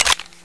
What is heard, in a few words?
sniper mag out